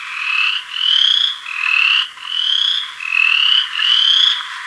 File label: duet chorus frogs; frog calls